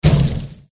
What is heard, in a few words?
hydra attack contact mid